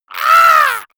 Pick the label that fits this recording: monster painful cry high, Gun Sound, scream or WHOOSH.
monster painful cry high